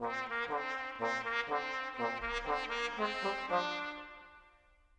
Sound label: Brass; Sonic Foundry (Sony Creative Software); Orchestral VOL-2; Modern 05